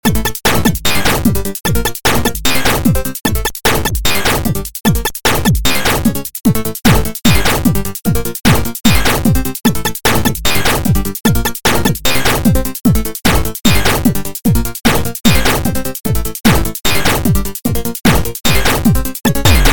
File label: chip